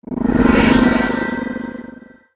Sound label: regen